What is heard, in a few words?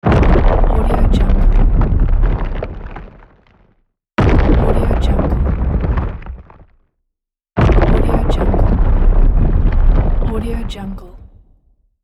iceberg crash impact